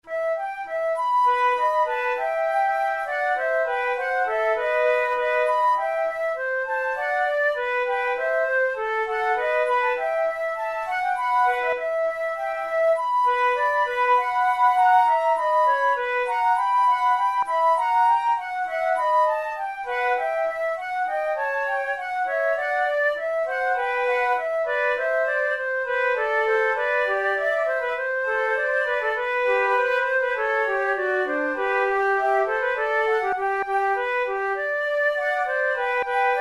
allegro; loeillet de gant op5 no6 iii

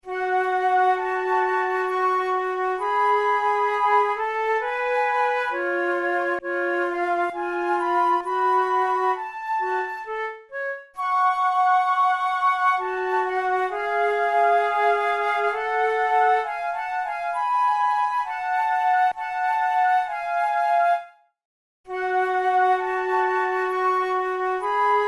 duos adagio, flute; devienne; nouvelle methode pour la flute 18

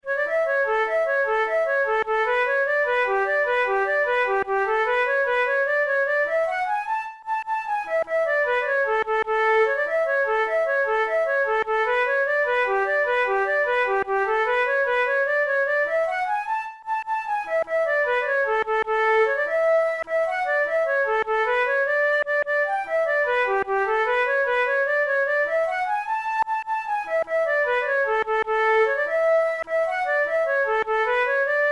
jig, flute

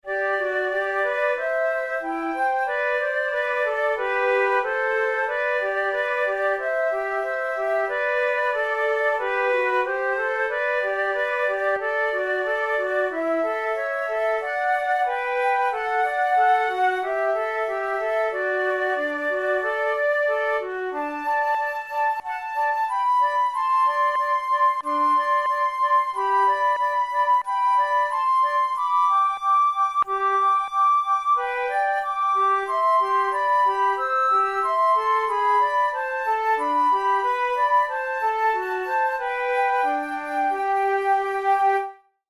duets, flute; kohler

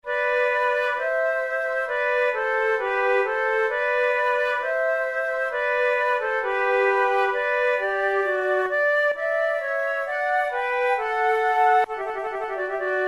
hymn

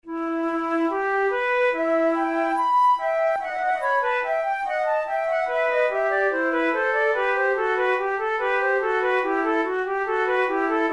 prelude, flute

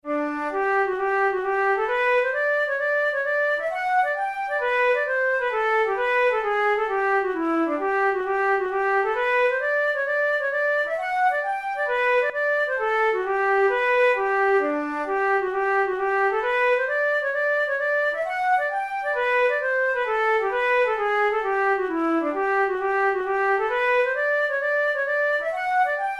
hornpipe, flute

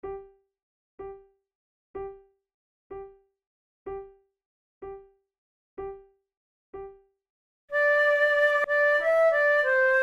duet, flute

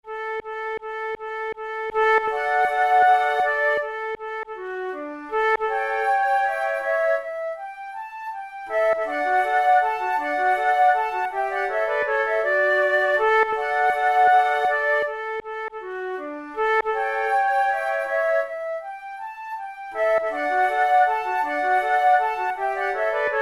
waltz trio, flute

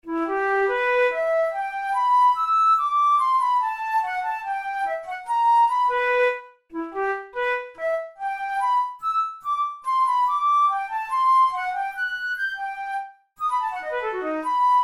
studies for the boehm flute; drouet 72

